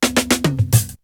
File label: Break; ZERO G - Datafile DISC 3